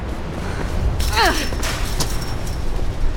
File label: pull up fence; mirrors edge